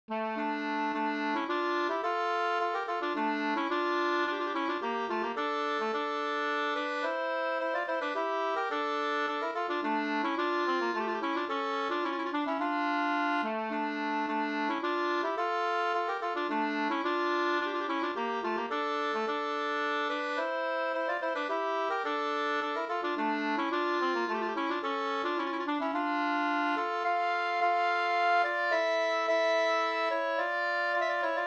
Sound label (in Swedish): polska dmollpolska